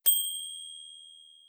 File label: browse mode; cthulhu